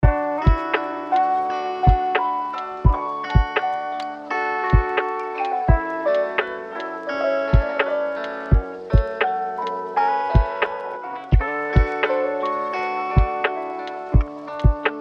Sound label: Bubbles